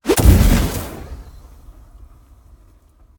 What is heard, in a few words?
idle flame spew; smite; god merlin